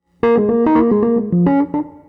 Electric Piano, Loops, Keys

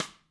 Rimshot One Shot; Drums

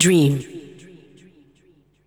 vocals; SoundFactory; SMPMANIA

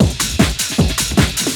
loop; SoundFactory; SMPMANIA

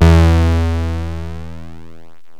dual saw, yamaha rm1x synth bass and lead

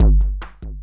Electronica Drumloop; Drums

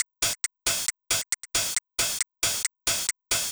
Hi-Hat Loop, Drums, Dance Beats

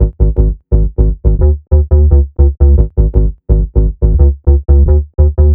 Synth Bass; Basses

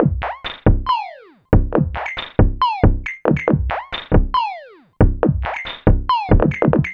Electronic Percussion

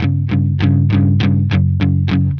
Electric Guitar, Loops; Guitars

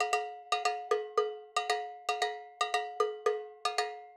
Acoustic Percussion

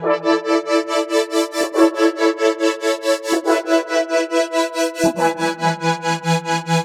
Pad, Synthesizers

